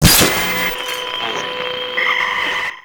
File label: Screen Blast; Warframe; Ambience; NineteenNinetyNine